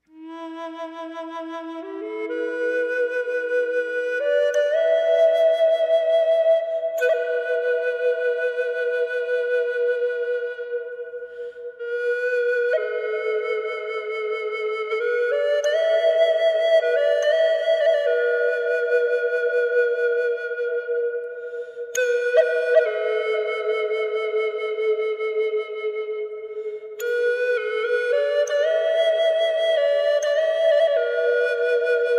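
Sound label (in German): E mit Reverb, drone flute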